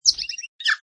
Tui laugh, Exotic bird - New Zealand bird Tui, bright chirping, chattering; mono; Birds; Animals; LynneMusic